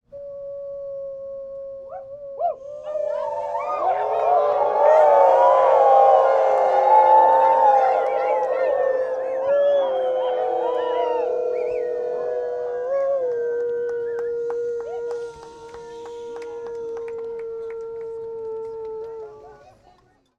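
human crowd howl joy happy rainbow gathering food circle; felix blume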